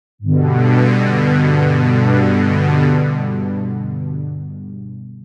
Synthwave SMOOTH SAWS; jup8

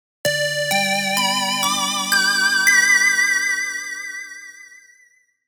Analog Married Bells; jup8

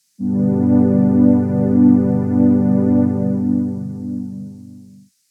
Juno Soft Pad; j106

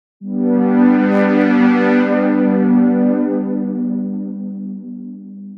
Slow Pad; jup8

